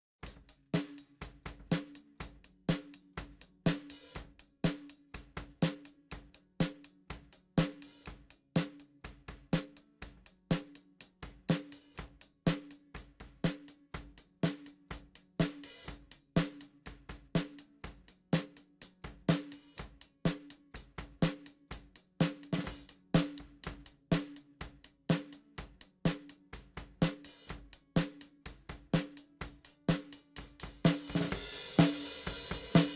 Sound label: snare drum